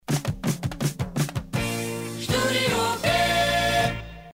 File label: Opener, jingles; studiob